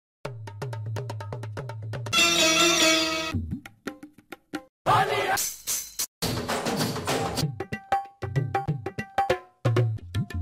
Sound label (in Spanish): arabes loops